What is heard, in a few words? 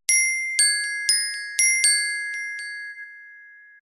Ping